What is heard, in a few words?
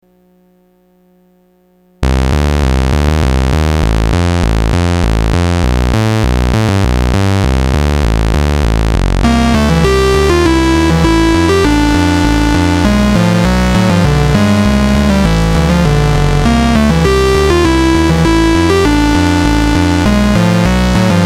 corresponding harmonics